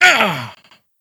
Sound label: grunt male